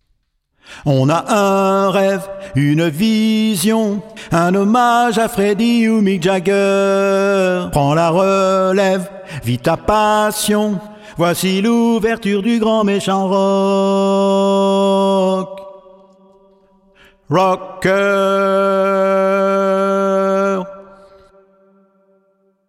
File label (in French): basse; L’audio basse (sans musique)